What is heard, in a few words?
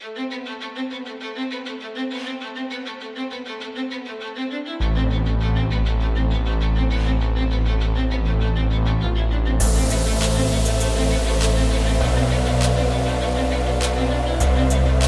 Trap Epic